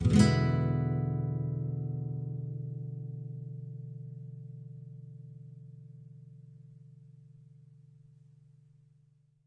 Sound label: Nylon Chord E7, Nylon Guitar Chords